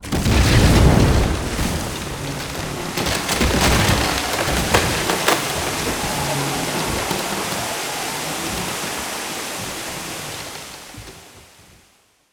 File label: Boat Sink; Chronicles of Narnia Prince Caspian